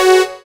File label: SYN DANCE; Sound & Vision - Gigapack II